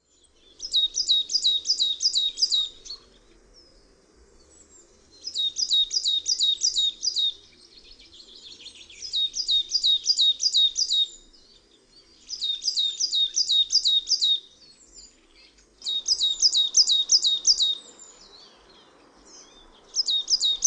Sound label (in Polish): SIKORA UBOGA, birdsounds